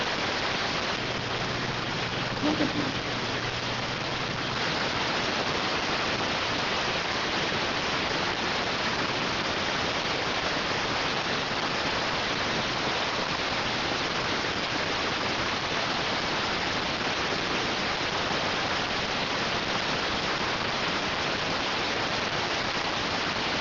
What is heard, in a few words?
rtty rx